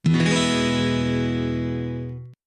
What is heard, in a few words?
e maj 022100, guitar chord